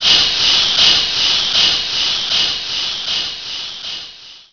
airlock fill

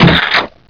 flesh

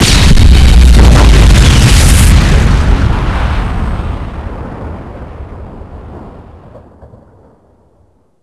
nuclear explosion

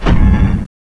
stomp; endboss

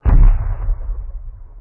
explode dist; Explosions

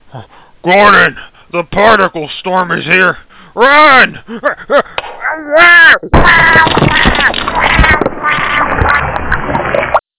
storm; eli

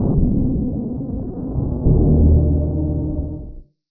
distant teleport

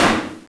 blunt hit

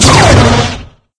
rifle fire

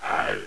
zombie idle

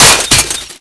fence drop

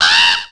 crow die

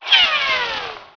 whizz

